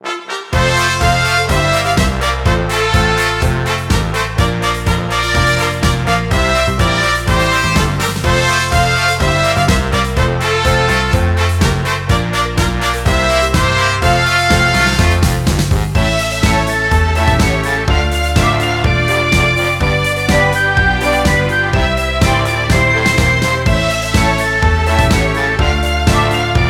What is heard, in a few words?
Music event; Power Pro Kun Pocket R